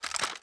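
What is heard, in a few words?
Equip Movement; Valorant; guardian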